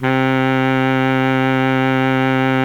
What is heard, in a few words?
Baritone Sax; Reed